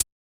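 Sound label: Hi-Hat Closed; Yamaha DJX-2B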